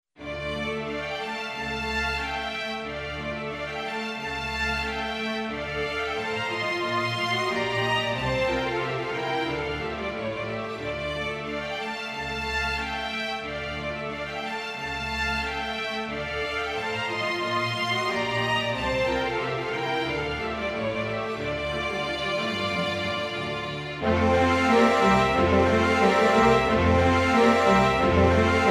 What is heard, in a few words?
Polka; Dovorak Czech Suite